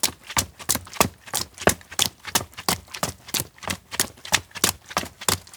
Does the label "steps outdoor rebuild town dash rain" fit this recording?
Yes